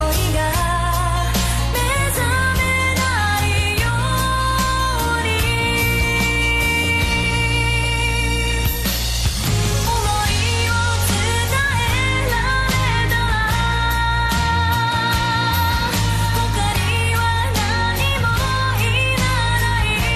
beautiful ringtone

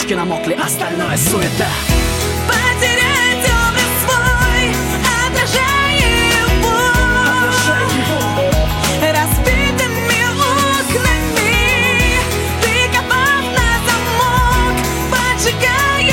russian song

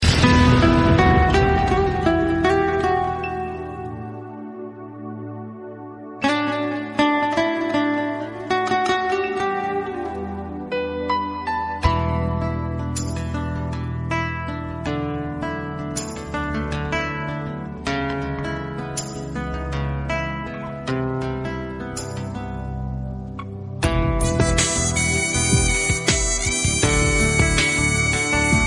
sad music; ringtone; kaante

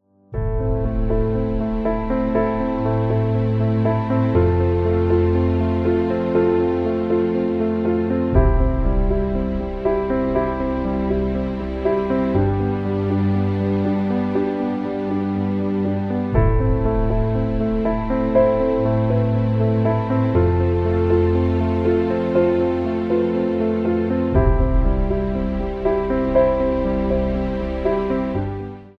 morning alarm ringtone

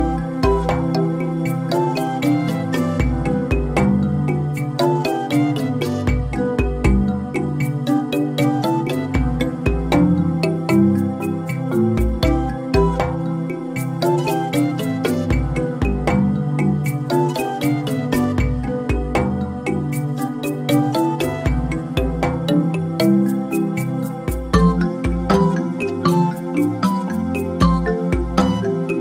i phone x slow african ringtone

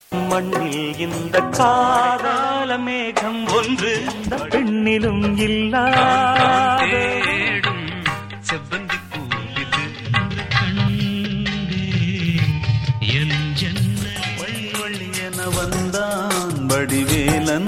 tamil evergreen

